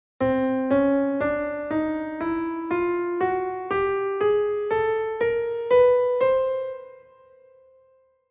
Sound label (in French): Gamme chromatique